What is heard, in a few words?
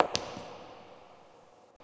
succubus step; queen girl